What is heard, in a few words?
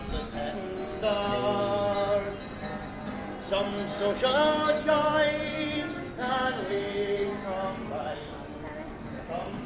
busker on Grafton Street